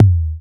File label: Kick, Kickdrum; Equipped Music; Loungin House